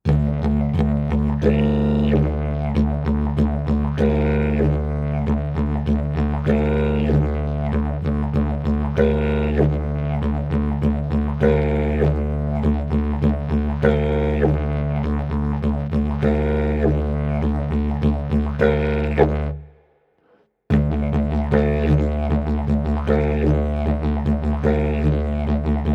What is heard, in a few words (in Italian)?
ritmo livello principiante